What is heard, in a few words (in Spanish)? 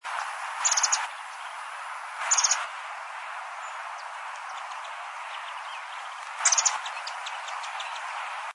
voz piojito común